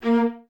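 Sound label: violas A#3 staccato, String Ensembles; Miroslav Vitous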